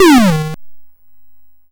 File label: YM Shoot; SFX; CHIPSHOP DELUXE; CHIPSHOP HITS